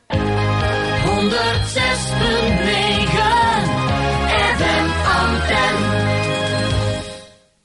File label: jingle; FM Antenne D